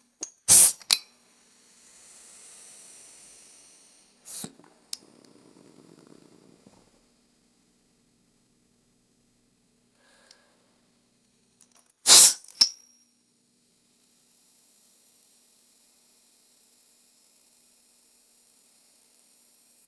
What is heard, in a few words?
metal cap popping off glass soda bottle